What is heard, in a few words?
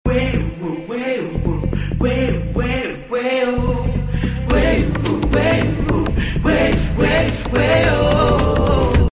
Happy Dance Bgm